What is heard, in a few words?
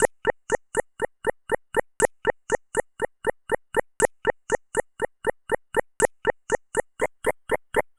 Bubble Beat, Electronic Beats; Sonic Foundry (Sony Creative Software); Myths of Technology